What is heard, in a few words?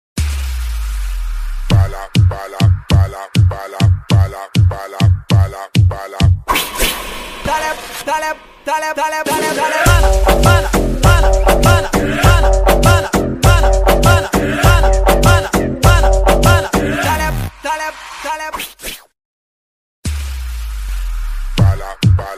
Ringtone; tik tok